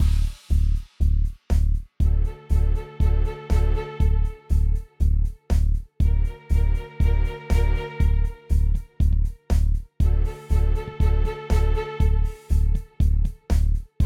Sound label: city action; Spiral Knights